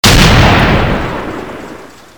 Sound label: rifle, weapons; sprifle